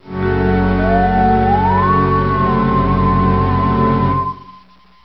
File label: Haunted